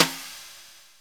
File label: brush roll s, Jazz Brush